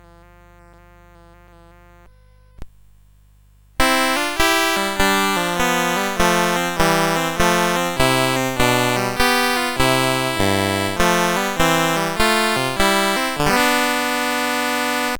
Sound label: Duet CSG8580R5